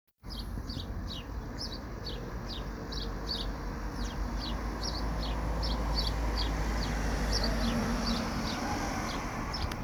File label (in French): Moineau Commun Chant